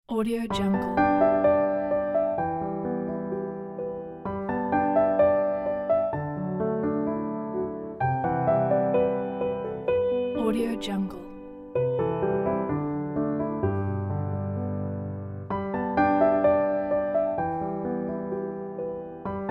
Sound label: oriental rivers inspiring solo piano